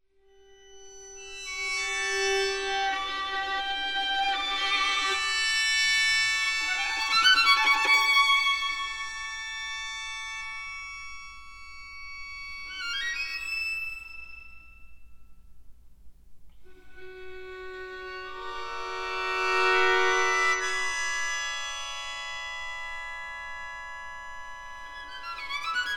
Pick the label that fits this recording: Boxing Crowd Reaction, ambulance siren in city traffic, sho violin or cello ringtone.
sho violin